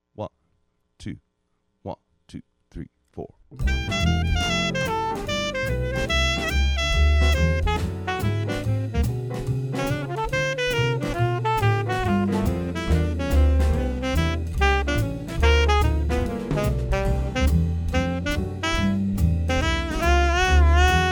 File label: swing alto sax